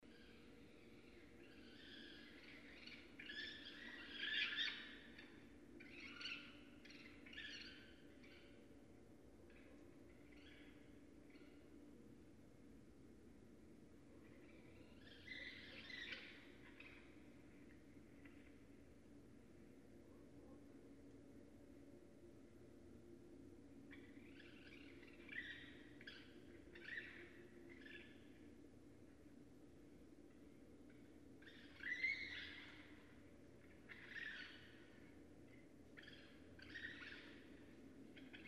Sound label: Raccoon; Northern Raccoon (Procyon lotor); night sounds